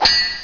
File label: sword; Prince of Persia